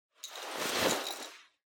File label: Pocket Watch Pocket Coat Put In